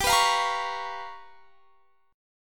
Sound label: G 7th Sharp 9th Flat 5th G; G7#9b5 strummed